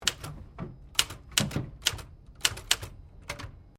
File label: Typewriter 1950s Sequence; Old typewriters; Typing; Offices